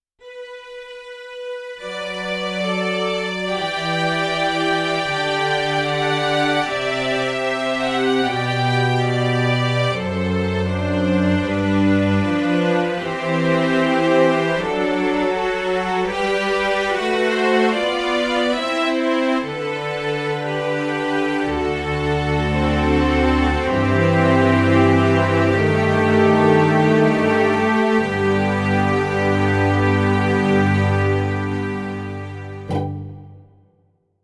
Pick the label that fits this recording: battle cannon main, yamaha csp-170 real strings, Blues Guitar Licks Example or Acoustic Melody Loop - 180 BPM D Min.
yamaha csp-170 real strings